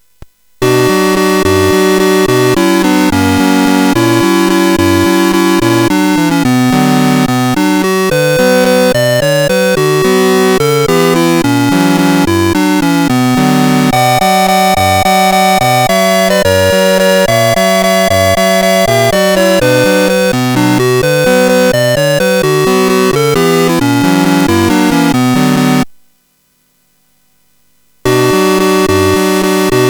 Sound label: Tum Balalaika, CSG8580